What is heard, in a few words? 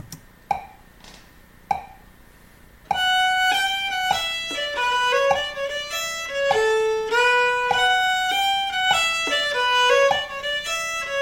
gavotte